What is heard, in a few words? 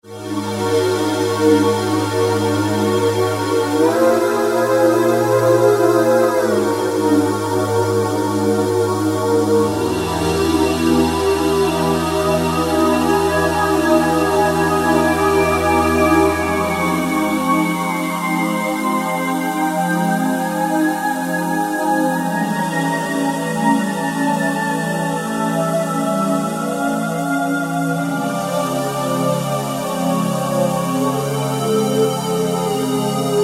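Pad Velvet Choir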